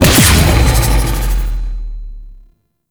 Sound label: Howl Damage; Warframe; Voruna